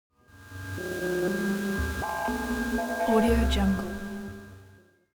sci-fi space spaceship calculator tension

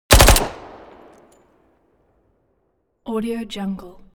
weapons smg uzi 45 heavy burst

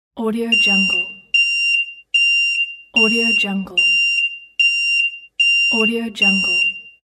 house alarm; studio-j

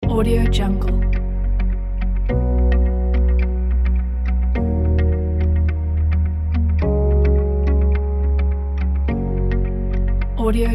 ambient music; brainalien

